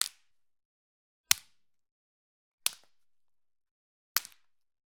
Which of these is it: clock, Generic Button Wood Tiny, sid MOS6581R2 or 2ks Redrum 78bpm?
Generic Button Wood Tiny